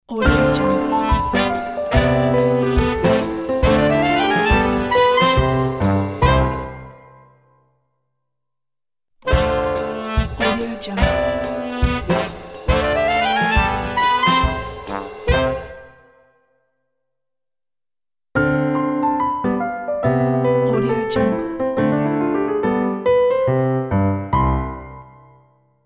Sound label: ident jazz